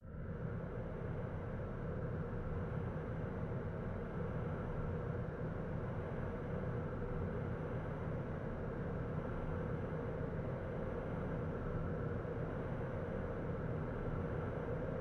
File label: breath synth; kami sound installation